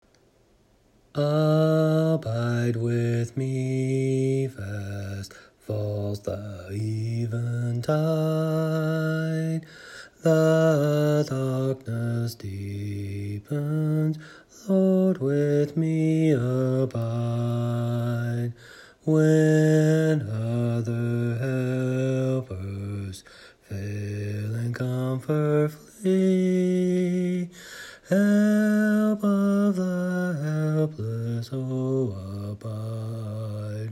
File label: Eb BASS